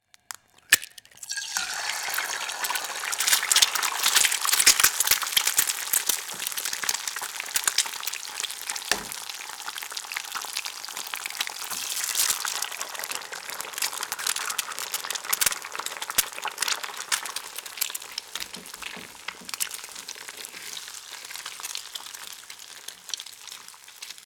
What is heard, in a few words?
Frying pan egg frying crackling sizzling